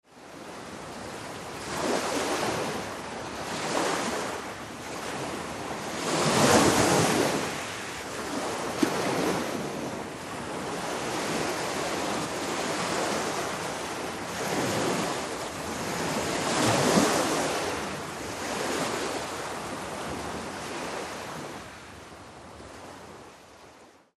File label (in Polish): Morze